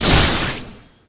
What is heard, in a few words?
Zantetsuball Hit